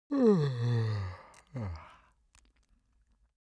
bocejo

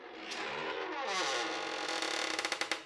Som de Porta Rangendo; Efeito Sonoro

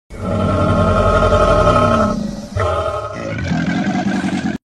um rugido de dinossauro

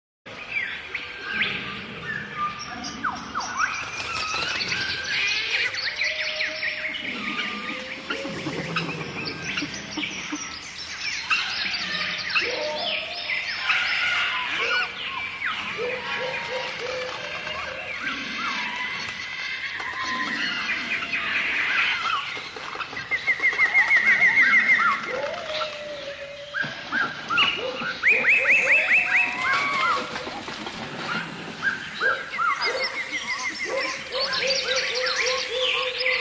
selva som da natureza efeito sonoro

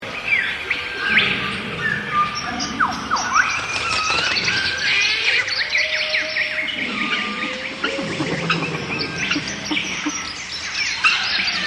som da natureza efeito sonoro

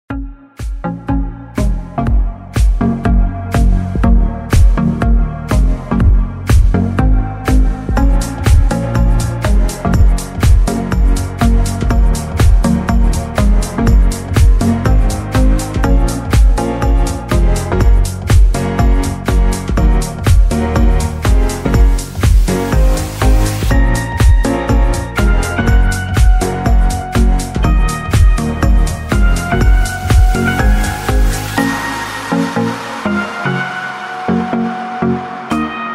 Música Eletrônica

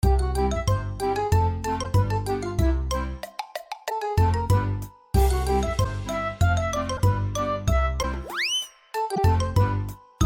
Música cartoon engraçada; Trilha sonora

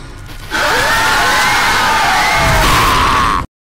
erro

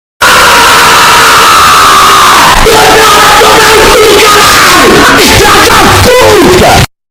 audio meme grito; não recomendo usar fones de ouvido

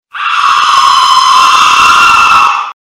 erro

